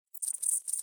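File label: Grasshopper Reverse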